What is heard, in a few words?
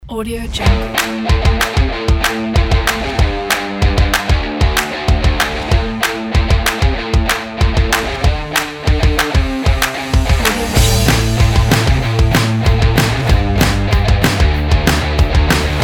bright upbeat sport energy